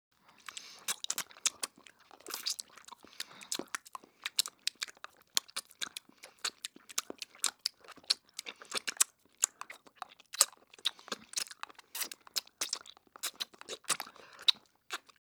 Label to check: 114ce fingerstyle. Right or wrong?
Wrong